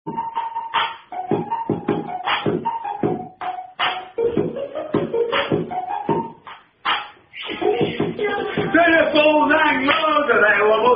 Solo Version Balls Hang Low

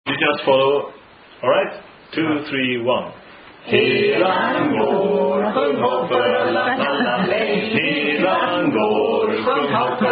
Swedish Drinking Song; Helan Gar

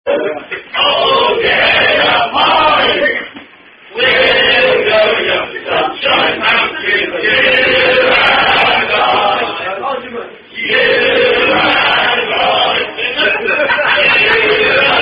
Rugby Club Song; Stratford Rugby Club Song

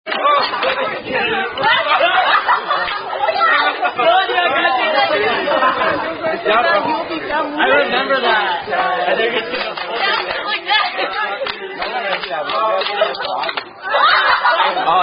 Clapping With Asian Students; Ring Ching China

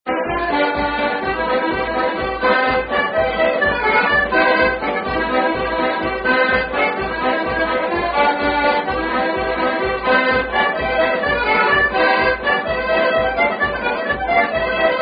The Soldier's Joy, Accordeon Band; Gargan's Athlone Accordeon Band; voice of the people vol 14

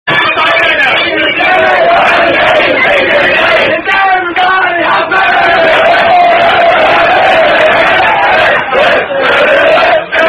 Rugby Song

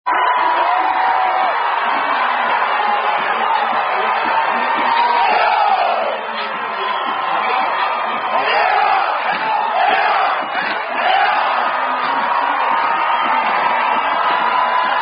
Hawaiian Haa War Chant; Hawaii Warriors Football New Haka

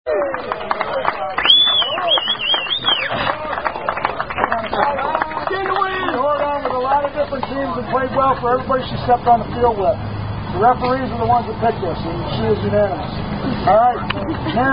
Down-Down Song

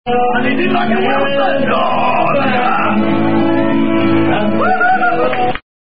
Drinking A Pint; Drinking A Pint Of Black Rat In 3 Seconds; Downing Pint Called Zulu Warrior

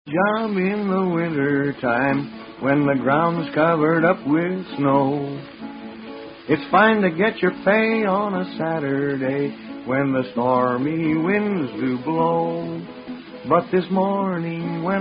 Hobo Spring Song; 1920s; the great american bum

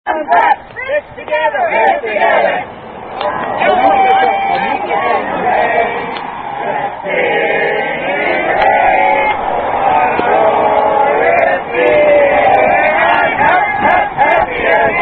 Hash House Harrier Song With Gestures

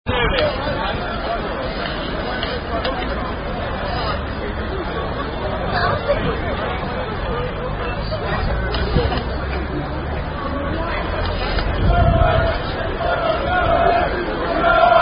Birmingham Blues Singing And Chanting; Bcfc; To Villa

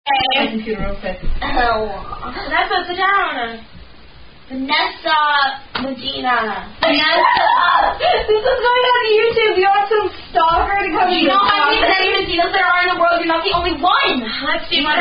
Ring Ching China, Hand Clapping Rhyme

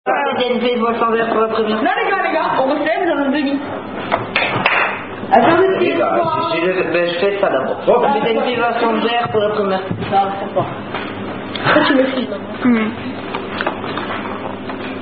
French Are You A Cardinal Drinking Game; Ortu 2007

